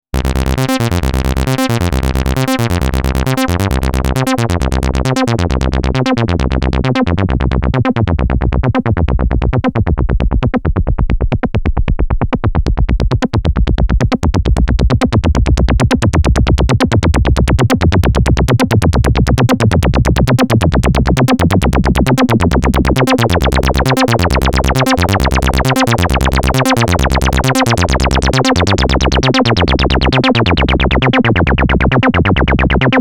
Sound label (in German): Bassline min Decay max Resonanz